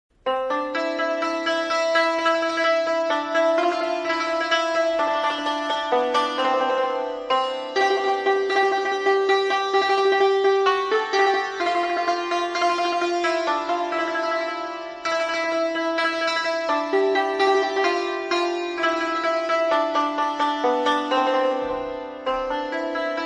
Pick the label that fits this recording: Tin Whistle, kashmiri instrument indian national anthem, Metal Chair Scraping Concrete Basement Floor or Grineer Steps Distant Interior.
kashmiri instrument indian national anthem